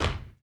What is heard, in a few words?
Body Perc; Spectrasonics; Supreme Beats; World Dance